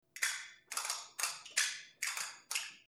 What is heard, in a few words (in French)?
castagnettes